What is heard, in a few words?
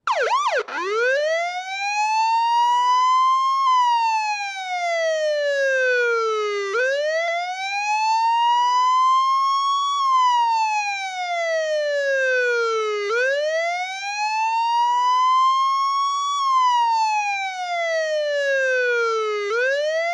Police Wailer Siren Close, On For 45 Seconds And Off At Tail.